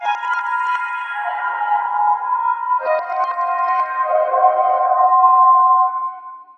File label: drones atmos; swivel